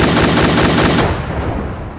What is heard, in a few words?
tone; Savage; Ground Vehicles; MEFFV; Bushmaster